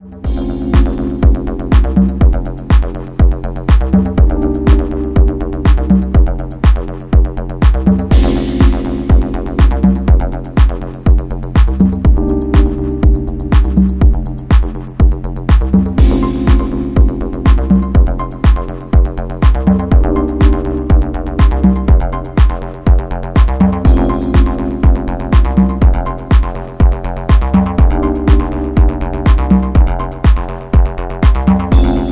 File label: Ambient Acid Instrumental No Trumpet